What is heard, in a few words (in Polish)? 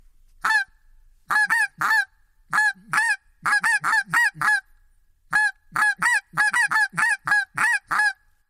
gęś śnieżna